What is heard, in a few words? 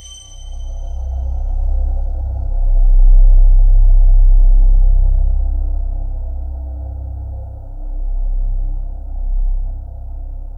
C1, Synth Line; 90 s Sample CDs; NiceBeats; SynthLine VOL-26